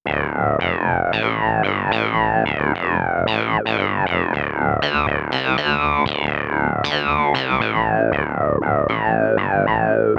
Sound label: vocal then bleed; davesmith mopho